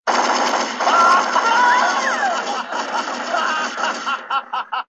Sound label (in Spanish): metralleta risa